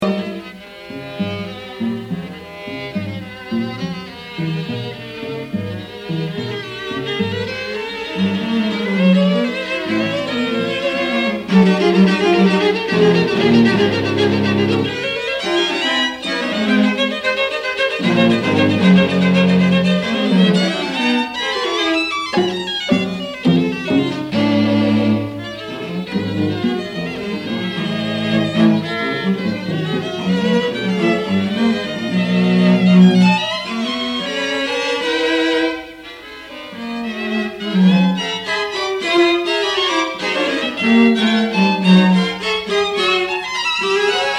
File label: string quartet